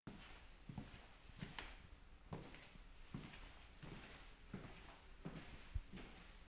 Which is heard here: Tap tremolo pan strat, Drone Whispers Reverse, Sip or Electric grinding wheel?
Sip